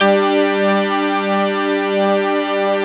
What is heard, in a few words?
BIG 5TH G4, JX-10; Greytsounds VOL-1